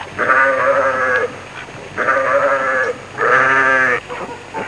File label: Bleating sheep herd